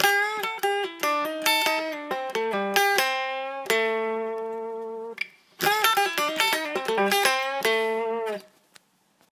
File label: lick